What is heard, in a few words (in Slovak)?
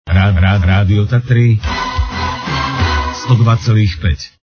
jingel; tatry